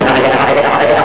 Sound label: WARBLE; HotLeather2